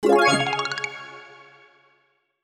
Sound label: win large win; netent